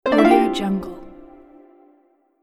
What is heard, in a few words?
harp